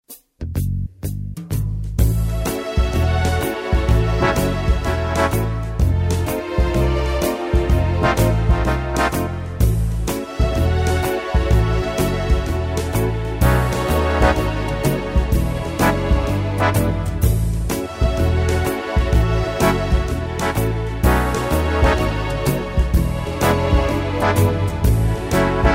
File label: swing